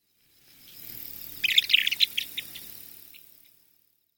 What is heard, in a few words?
kiriai, Sakata